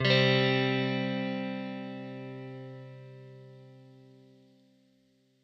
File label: GUITAR TREM CHORD